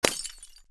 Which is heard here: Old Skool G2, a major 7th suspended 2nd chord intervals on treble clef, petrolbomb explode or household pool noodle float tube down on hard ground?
petrolbomb explode